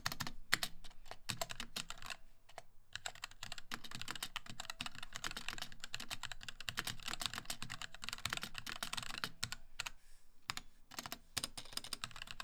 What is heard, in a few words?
NYM96 Kail Box Red